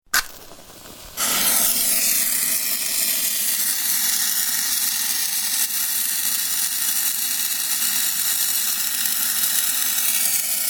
Bomb fuse being lit which fizzes and burns until the bomb explodes; Blowing A Hole In The Wall; Bomb fuse being lit which fizzes and burns until the bomb explodes causing major structure damage.